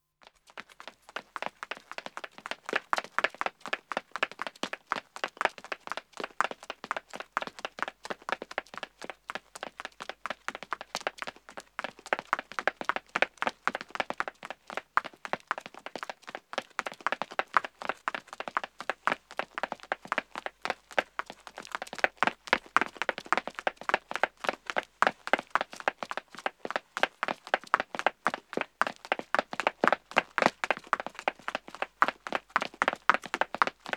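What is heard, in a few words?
Pavement Running 1 man & 1 woman; Footsteps; BBC Sound Effects Library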